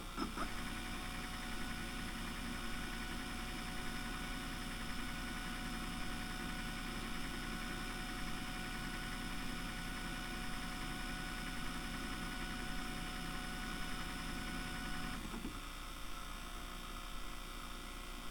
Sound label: VCR